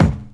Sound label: Bd Live, Kick Drums; Zero-G; Soundclash